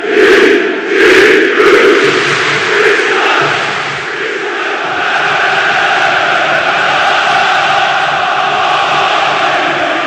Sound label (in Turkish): Besiktas Zil Sesi Carsi